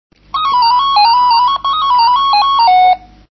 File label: melody